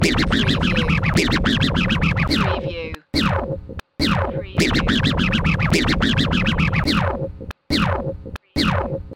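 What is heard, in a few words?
Scratch 105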